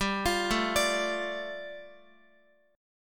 G 7th Suspended 2nd, Guitar; G7sus2 arpeggiated

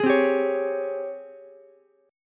G sharp Major 11th G sharp, Ukulele C6; 13,12,15,16; JGuitar

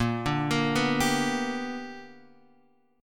B Minor Major 7th Sharp 5th, chord

